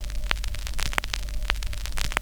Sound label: RECORD; East West - Phat & Phunky CD2; 90's Sample CDs